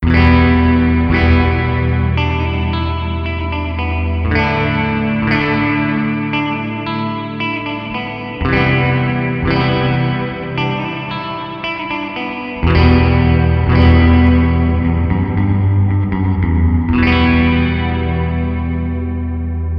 Gtr Amp Digital Reverb Church

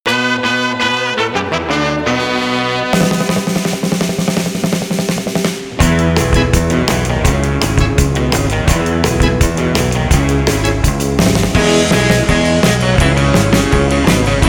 Brass

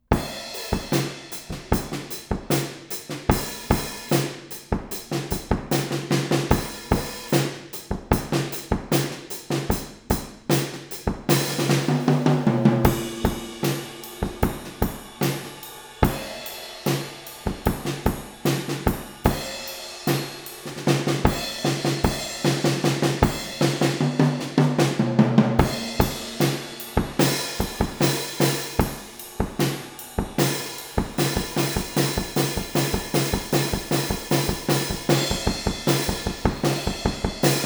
Drums API

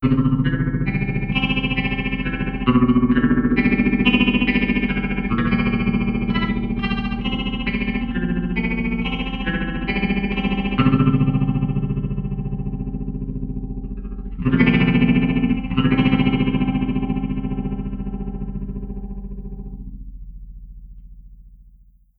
Trem High Speed

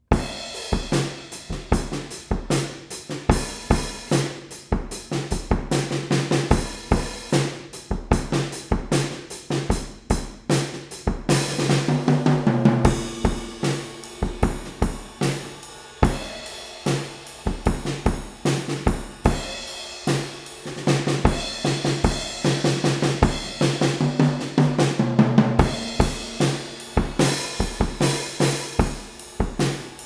Drums RCA hybrid

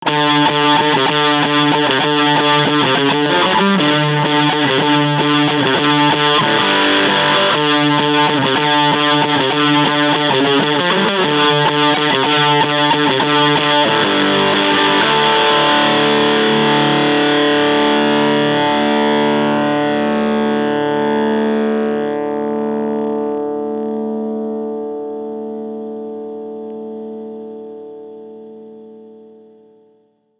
Magnasync URS fuzz

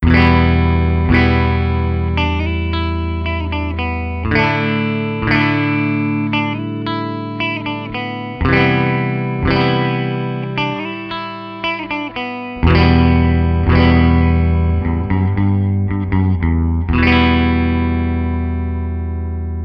Gtr Amp Close up; Philips Mixing Console c. 1974

